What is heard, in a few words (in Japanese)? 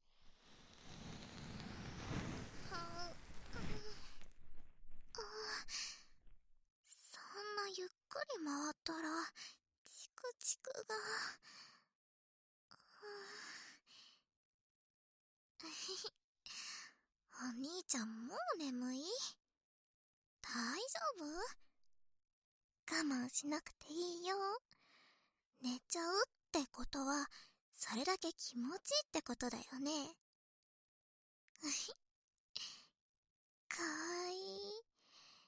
左耳かきパート, ASMR